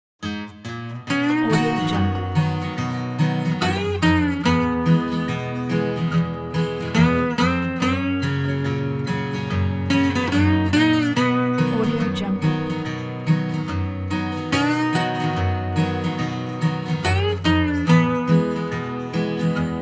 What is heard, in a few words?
country acoustic slide guitar